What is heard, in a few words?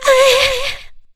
HIGH YEAH, Funky Vocals; USB Soundscan vol.18